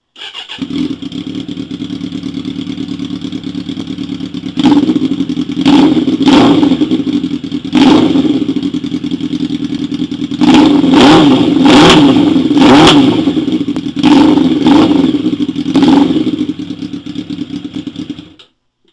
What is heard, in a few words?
Bandit600 no endcan